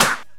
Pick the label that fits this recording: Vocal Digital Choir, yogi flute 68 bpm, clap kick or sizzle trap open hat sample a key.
clap kick